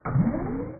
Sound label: close